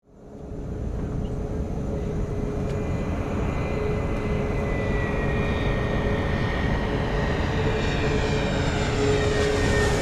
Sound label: Airplane interior landing in airport passenger perspective; vehicle